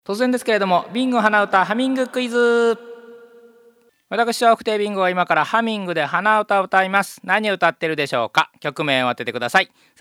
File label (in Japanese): hanauta